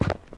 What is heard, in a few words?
footsteps; smite; chaac